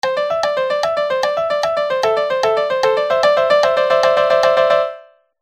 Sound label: Vl1 Vl2 Vla Reduction; Udelt takt